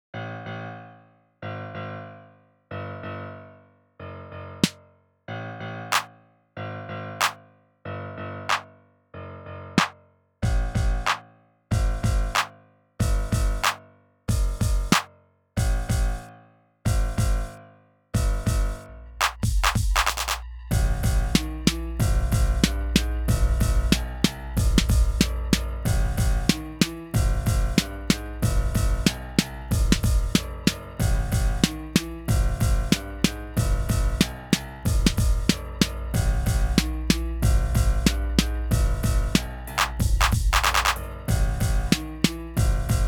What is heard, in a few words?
beat